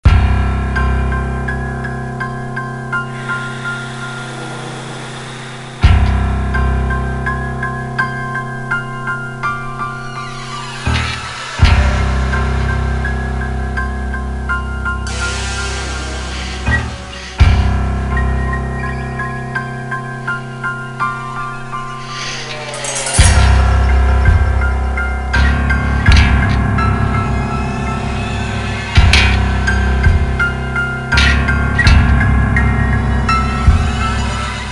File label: Suspense; Metropolis Crimes 2009 NDS gamerip